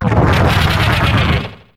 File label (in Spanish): Grito de Gumshoos